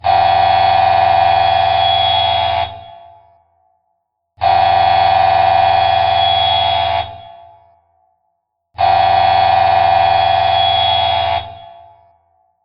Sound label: factory siren